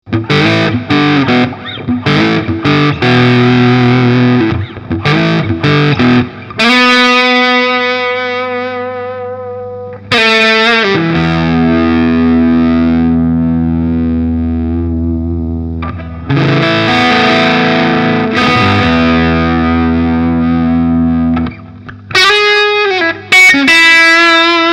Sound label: full gain; BEETRONIX